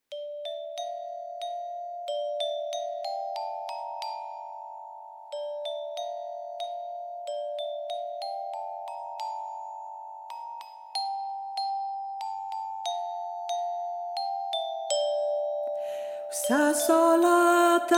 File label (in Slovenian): Solata in krompir vokal ZALOSTNA